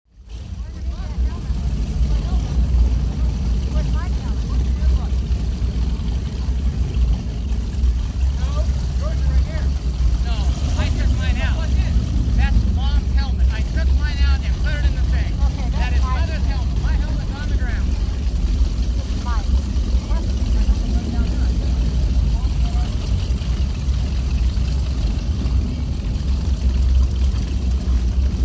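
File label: Power Boat Interior, off shore power boat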